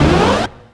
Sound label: use medkit